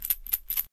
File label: shaking a box of tablets